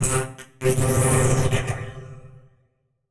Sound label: Title Transition Electrical Zap; Adobe; Production Element